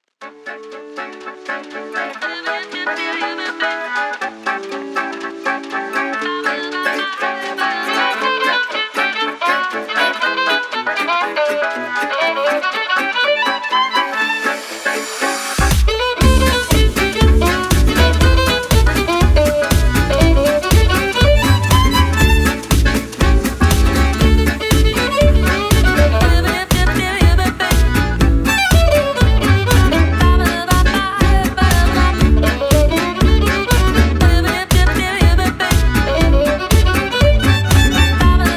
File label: Violin